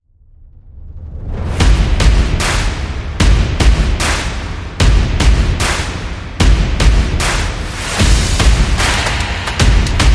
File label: Born to Win, sport percussion and stadium anthems; football